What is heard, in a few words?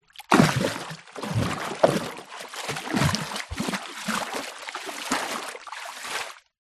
sport swimmer movement in water